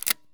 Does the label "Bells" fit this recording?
No